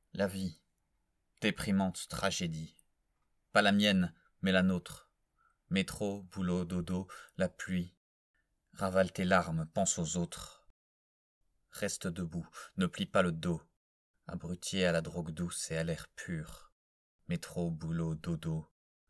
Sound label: Bebop